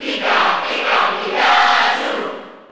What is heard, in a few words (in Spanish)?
Cheer Spanish; Pikachu; SSB4